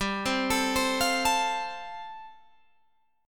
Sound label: G 7th Suspended 2nd Suspended 4th, Guitar Open G